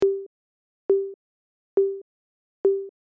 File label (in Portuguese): bongo sem reverb